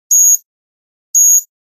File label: music alarm; Samsung GT i7680; alarms